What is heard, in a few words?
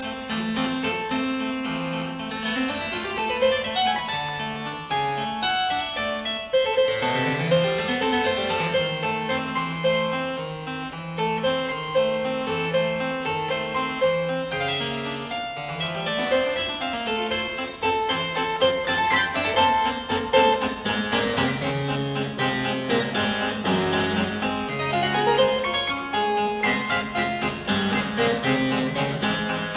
Harpsichord Sonata No 1; Allanbrook